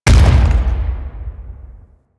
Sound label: Thumper Bot Thumper Impact Close A; Warframe; Grineer